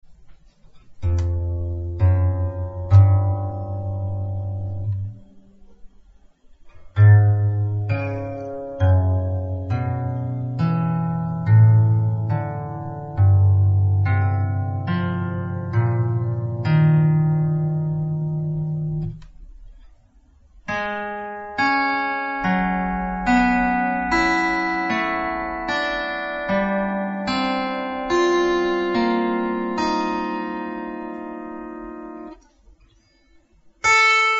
Zither Stimmung; Instrumentenkunde

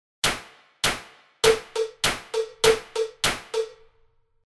Gehoer Rhythmus; Gehoerbildung

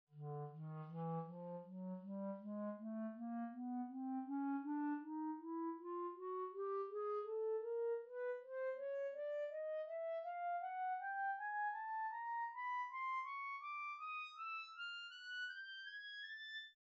Klarinette; Instrumentenkunde

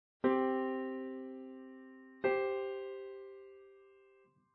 Gehoer Quinten Quarten; Gehoerbildung

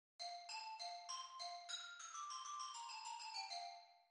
Xylophon; Instrumentenkunde

